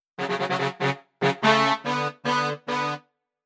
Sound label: Brass